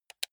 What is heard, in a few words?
console controller playstation press